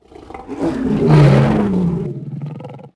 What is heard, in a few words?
Allo Roar, Dino